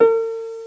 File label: harp; My Science Fair